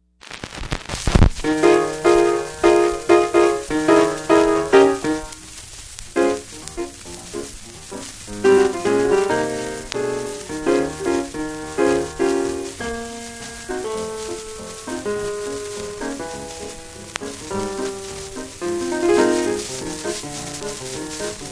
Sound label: piano